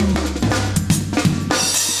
chique 120, loops, 120bpm; Sound Effect Library

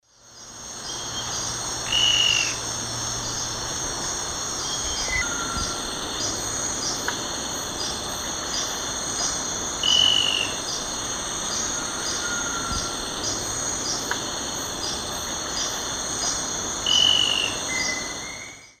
Lophotriccus vitiosus, calls; S. America; Eastern Lowlands

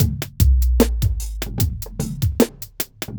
hiphop

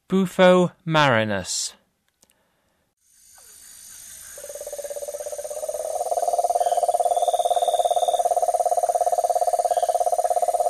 Bufo marinus, Frogs of Ecuadorian Amazon; S. America

